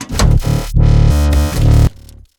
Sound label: annihilation mech mechanical texture sequence modulation; bluezone; sonniss gdc